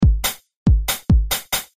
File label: 140 tribal